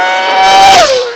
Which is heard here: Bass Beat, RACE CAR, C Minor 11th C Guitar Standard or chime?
RACE CAR